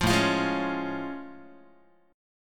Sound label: A Augmented Major 7th A Guitar; strummed; 8,5,8,6,5,x; JGuitar